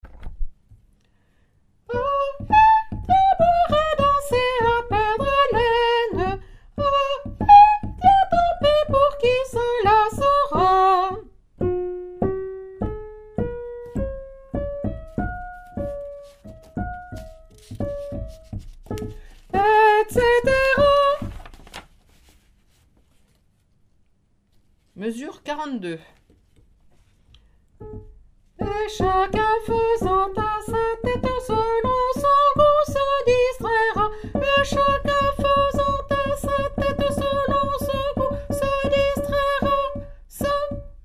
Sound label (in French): chanson villageoise soprano, chorale